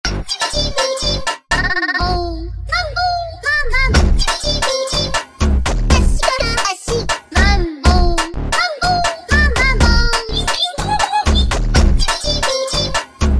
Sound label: Funk